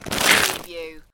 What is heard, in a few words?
Snow and Ice, Ice hard gravel scrape fragments